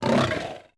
Lion Fort Vox; Civ4 warlords

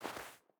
footstep barefoot snow walk; Rust

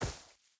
footstep burlap grass jump land; Rust

